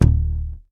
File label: YAMAHA5 MUTE D1, Bass